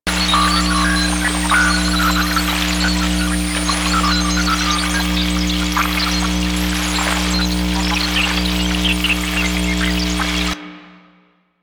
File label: Computer Terminal Loop; Ambience; Props; Warframe; The New War; Zariman; Quests